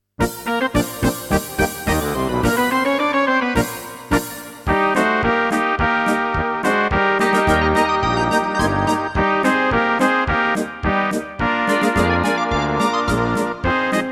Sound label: Kolinska polka